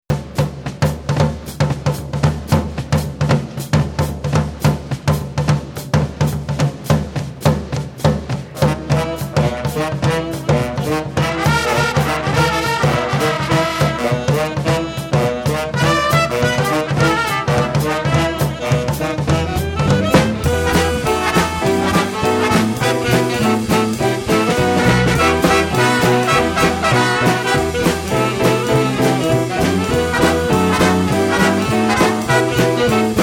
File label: Big Band, Swing Band